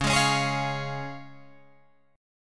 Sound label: C sharp Major, Guitar Drop D